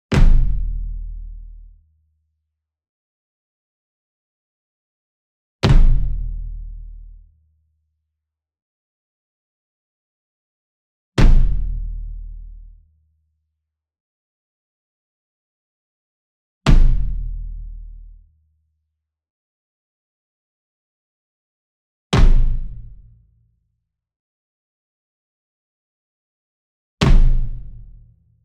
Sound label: ENSEMBLE DRUM Bass Drum Marching Hit Near; boom library; Cinematic Strikes Construction Kit